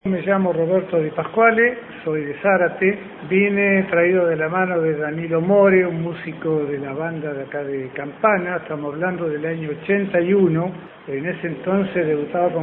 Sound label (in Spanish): banda municipal